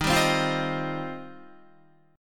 Eb 6th Add 9th, Guitar; Eb6add9 strummed; JGuitar

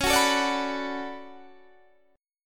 D Major 13th C sharp, chord

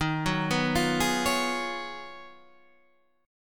Ab Minor 13th, Eb, guitar chord

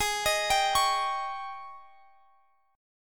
G sharp Major 7th Suspended 4th G sharp

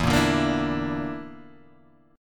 Bb Major Flat 5th E, chord

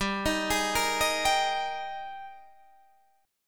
G sharp Major 7th Suspended 2nd

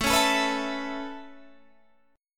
A Major Add 9th A, chord

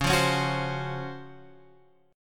C sharp Major 11th, chord; C#M11 Chord {9 8 11 11 X 8} strummed

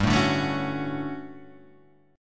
D-Major 7th G; DM7/G Chord {3 0 0 2 2 2} strummed

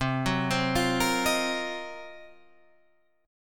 D sharp Minor 6th Add 9th C, chord

